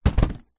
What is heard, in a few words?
cardboard box parcel heavy set down wood deck external; zapsplat